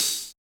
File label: HH, Yamaha DJX 2B